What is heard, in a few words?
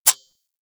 Reload; Warframe; Weapons; Zylok Prime